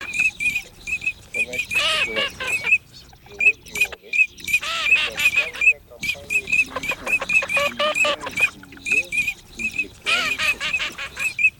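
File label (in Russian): Чирок-свистунок Alzavola, манок; plurifon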